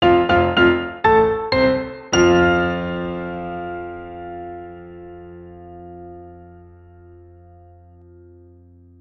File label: Victory Sting